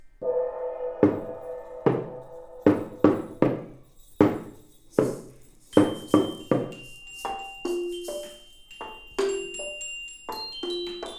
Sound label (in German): Klingelton